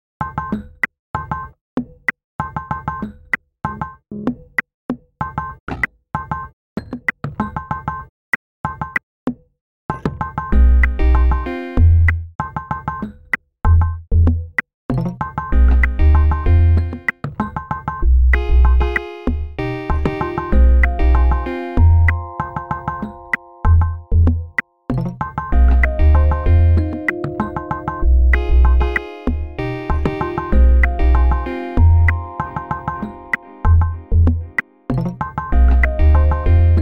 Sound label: prepared rhodes; zvon